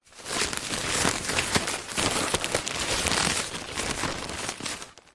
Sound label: leisure kite nylon handling